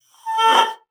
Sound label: BASSOON; Xsample VOL-6